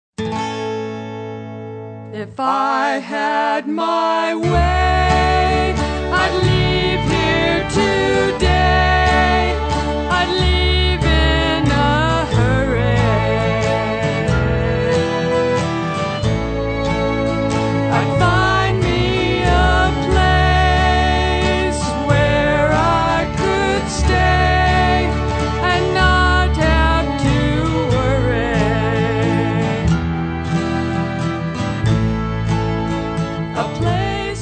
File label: Tenn Blues